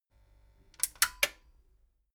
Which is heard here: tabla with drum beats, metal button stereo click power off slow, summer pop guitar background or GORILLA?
metal button stereo click power off slow